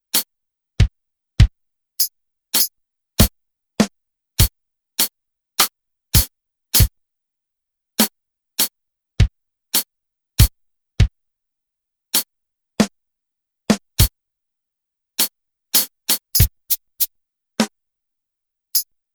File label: composition drum